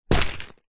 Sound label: foley luggage bag small fabric metal buckle set down